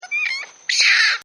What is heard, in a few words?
Monkey; Monkeys; Animals; Partners In Rhyme